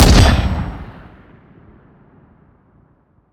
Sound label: aircraft cannon; battle